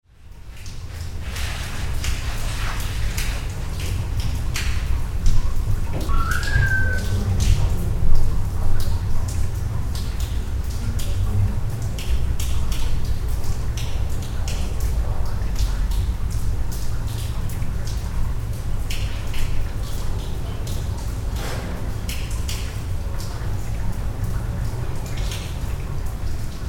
berlin backyard

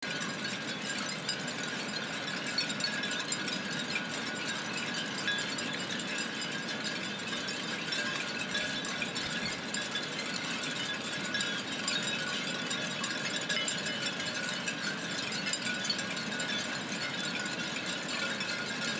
Sailboat Concert

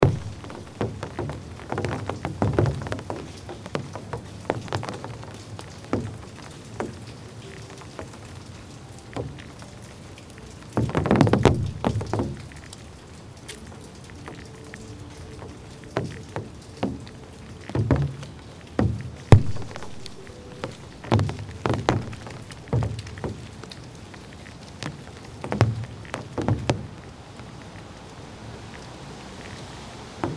Brooklyn NY Umbrella Percussion

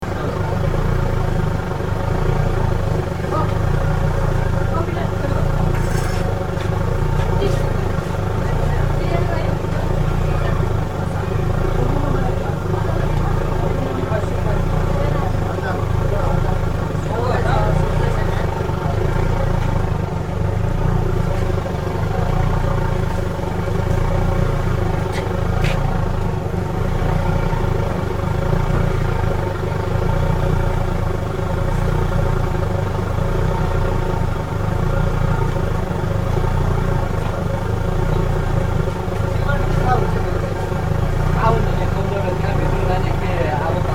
momo steamer, nepal

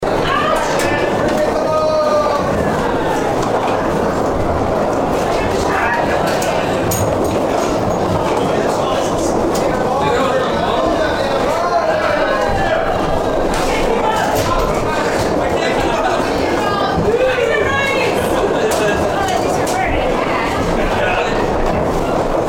superballs; denver

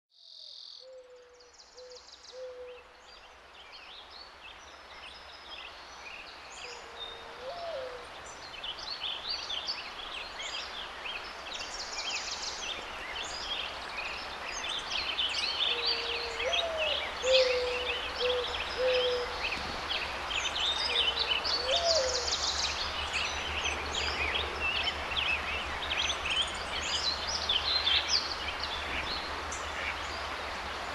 Sonoma Ambiance

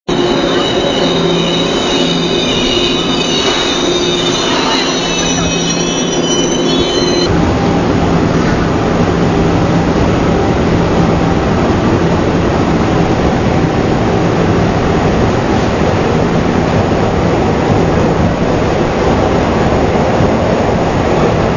Seoul Subway Birdsong

